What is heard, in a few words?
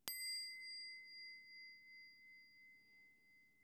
smallbowl int weak sound